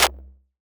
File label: Snare C, Hip-Hop Drum Kit; 99Sounds x Monosounds; Moon